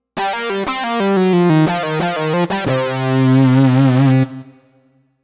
Lead Synth Lick; Dr. Fink's Funk Factory

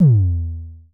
Mild Thump Kicks E2, Drums, Vermona Kick; Vermona Kick Lancet; LookIMadeAThing Kicks; Mild Thump Kick - Precise

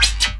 ROBOT WIBBLE, Synth FX Misc

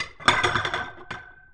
PLATE, HOUSEHOLD; AKAI Anime Sound FX

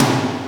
TOM D, Drum Dry; East Collexion; Drum 1

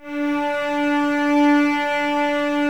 Cellos No Vib D 4, String Ensembles; Miroslav Vitous

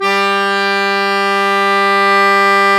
Accordians, Euro. Accord

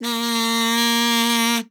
KAZOO; Xsample VOL-9

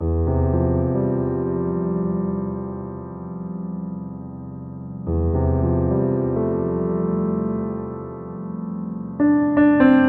Piano

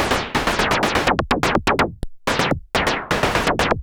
SH101 Clocked LFO Rhythm (125BPM)

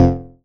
bass F1, PPG Wave 2.2

